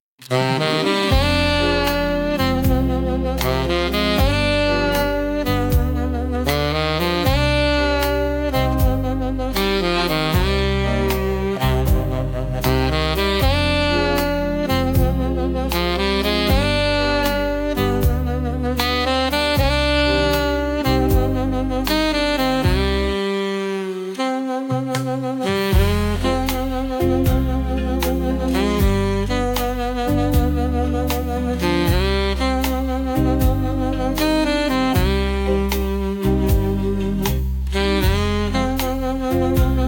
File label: sax and soul